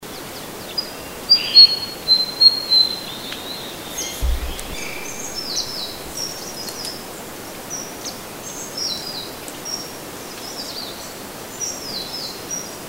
suara burung sikatan

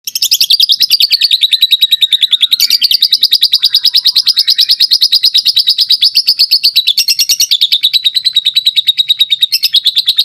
ngekek panjang lovebird; prestige

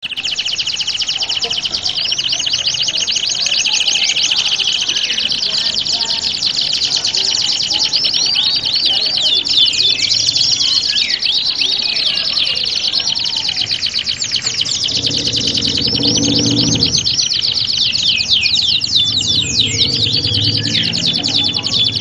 simulasi lomba kenari standar

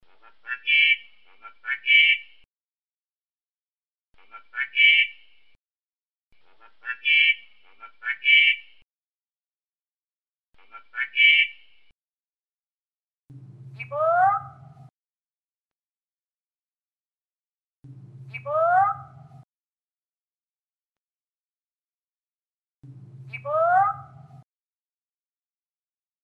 masteran spesial beo

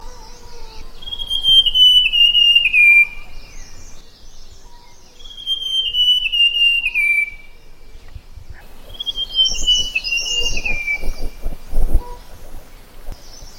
sibia kepala hitam

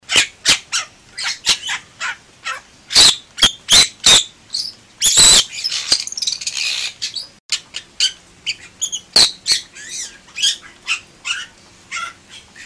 suara jalak bali

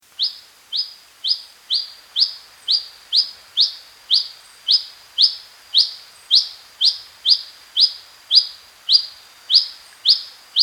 suara pijantung kampung

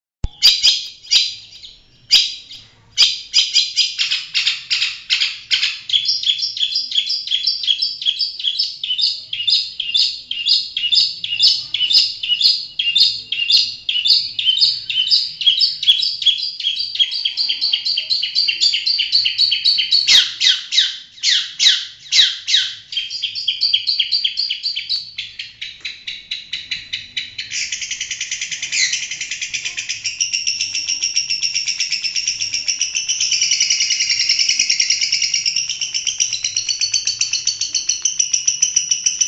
kombinasi cendet variasi

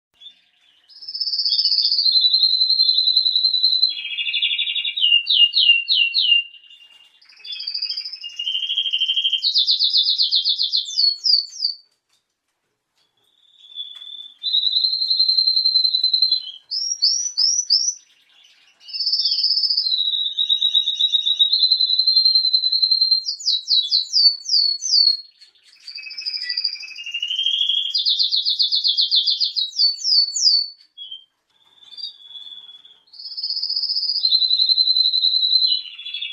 suara kenari mehringer; omkicau

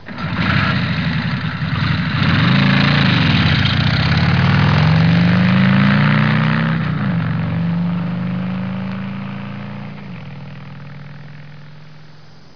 suara mobil

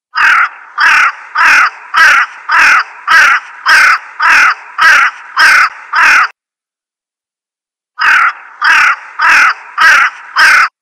gagak

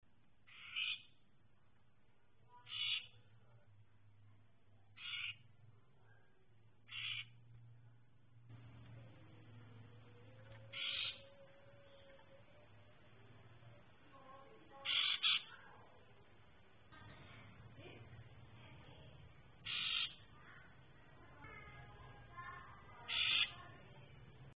suara betina cipo

